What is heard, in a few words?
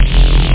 plane